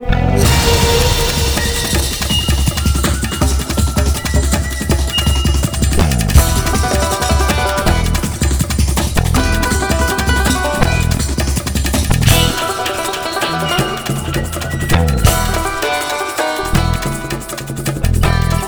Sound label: Rainforest Boss; frogger beyond